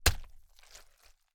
gore - wood beating flesh wet blood splat - medium; Timothy McHugh; Gorification [HD]